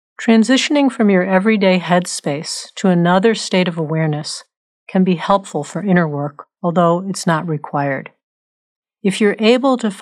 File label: Relaxing Going Inside